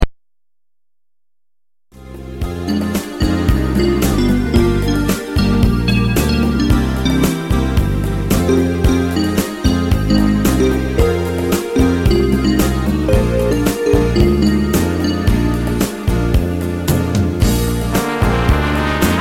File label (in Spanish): rumba de brasil; karibik